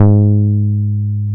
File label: BAS MKS-50, Roland; Sound & Vision - Gigapack II CD 1